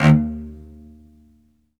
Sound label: SOLO CE, Strings; ILIO